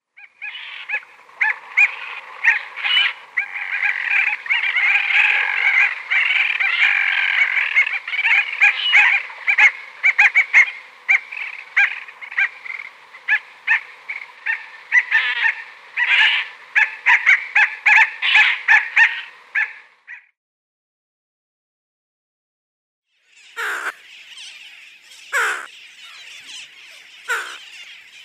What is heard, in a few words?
Bridled Tern